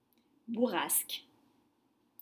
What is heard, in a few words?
bourrasque gust of wind